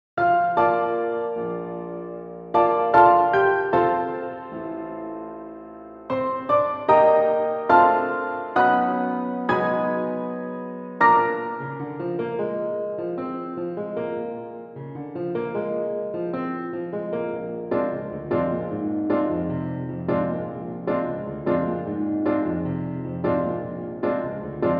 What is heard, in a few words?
satb accompaniment track; Accompagnement musical